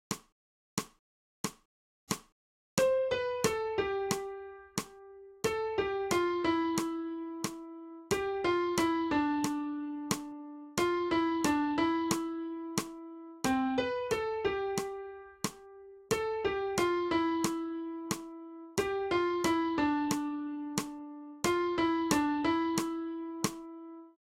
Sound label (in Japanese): 新フレットレス